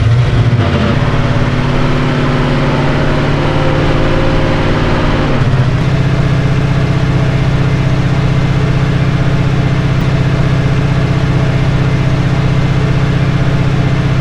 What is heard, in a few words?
fourth cruise loop, vehicles limousine; sligwolf